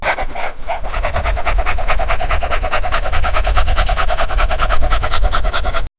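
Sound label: dog running sound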